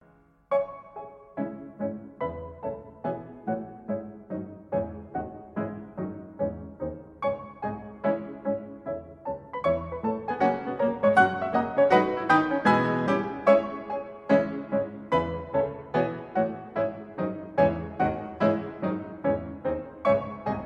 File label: Symphonic Studies, Etude IV, Piano Works; Vladimir Ashkenazy; Schumann